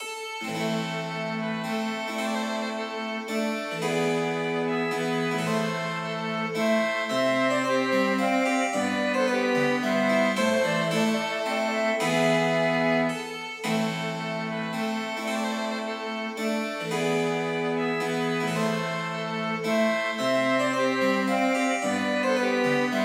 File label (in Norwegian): Air for fløyte, fiolin, cello og cembalo